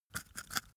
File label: rodent eat short